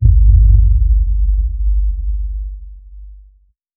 The Deepest Boom, Cinematic Impacts; 99Sounds; Rumore Cinematic Impacts

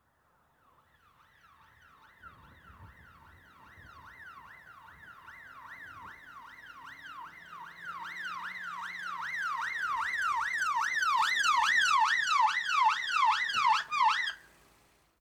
Police Car Arrive With Yelp Siren; Emergency; BBC Sound Effects Library

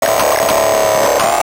Tech, Weird Side Samples

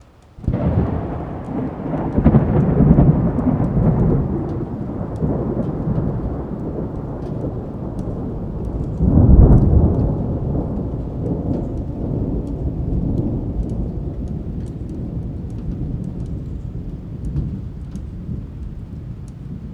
ambient thunder clap distant with rain; mono; Soundholder

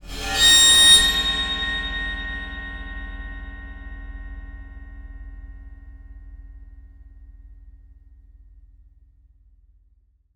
Bowed Cymbal, Highs; Soundopolis; Fienup

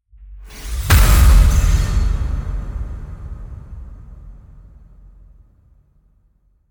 Cinematic Hit; SoundBits; Cinematic Hits Transitions

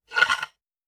stick slide, Wooden Staffs; The AudioVille; Wooden Staffs and Sword Fight

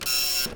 Dot Matrix Vintage Printer Long Burst; Chris Skyes

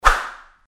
Fighting Swing Sound; FXHome

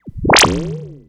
Analog Synthesized UI - Bend Cancel Disengage; The Chris Alan; Analog Synthesized UI & SFX

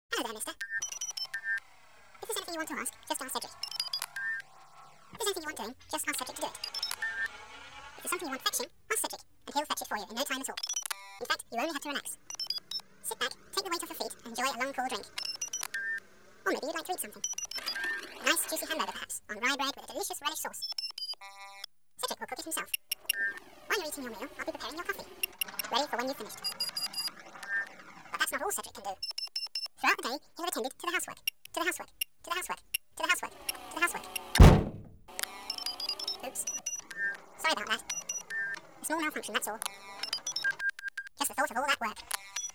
Space Fantasy, CD Robo; Cedric The CD Robo; BBC Sound Effects Library

